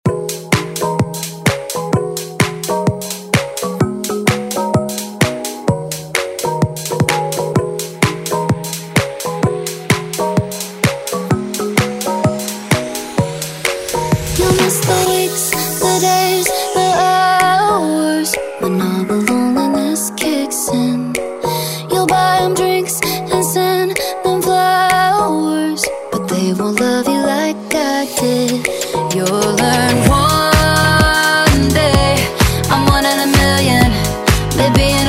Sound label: Dance